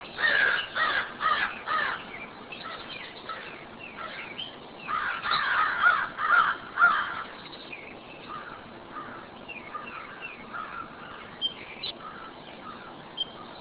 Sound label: crows